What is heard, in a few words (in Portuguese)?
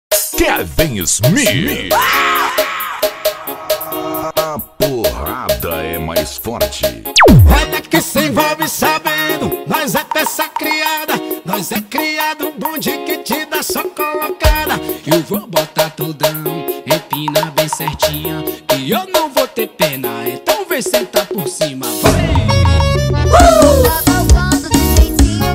forro funk paredao; forro funk 2026; paredao treme terra